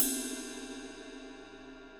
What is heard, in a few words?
22 inch heavy ride ping, cymbal; hhx legacy; Sabian